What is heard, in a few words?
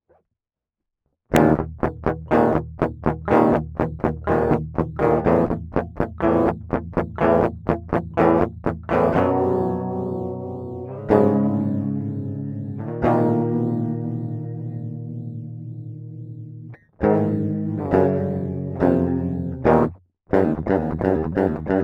DiMarzio flanger